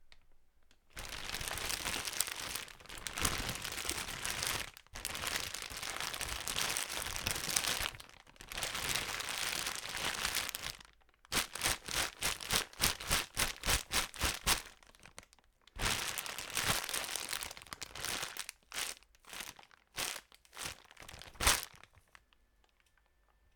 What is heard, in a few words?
Crumple Plastic